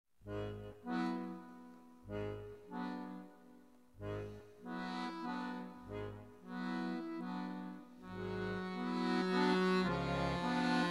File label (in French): valse triste